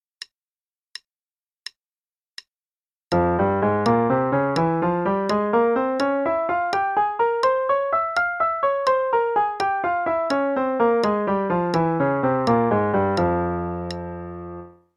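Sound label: f harmonic minor, 3 octaves (qn=84); CM Level 6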